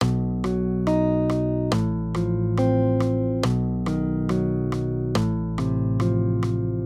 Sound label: maj triads; B-F; accompaniment